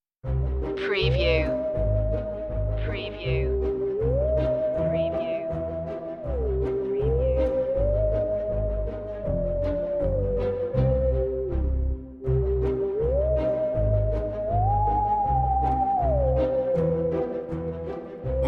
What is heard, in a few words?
spooky adventure no perc